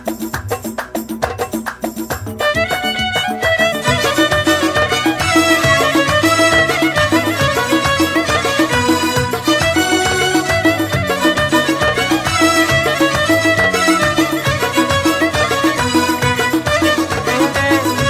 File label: Violin